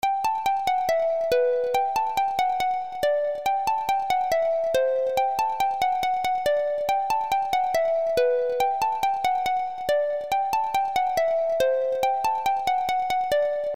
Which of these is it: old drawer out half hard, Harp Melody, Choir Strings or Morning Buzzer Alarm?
Harp Melody